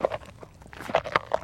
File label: Camel Crunch Carrot; camels